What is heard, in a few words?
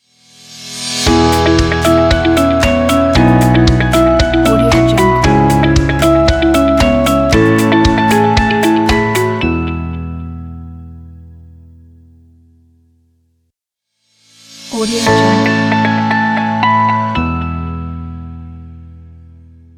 business commercial logo